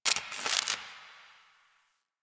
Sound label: Spark One Off; Ambience; Interior; Warframe; Orokin Derelict